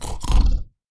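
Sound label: beastmaster boar attack; jabali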